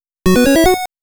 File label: Start Sound; konami famicom chronicle vol.3 rom cassette edition